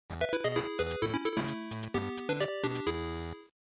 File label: General Miss, bgm